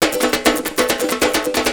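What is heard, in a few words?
TIMBALE LOOP, Techno Trance; E-MU Formula 4000 Series Vol. 2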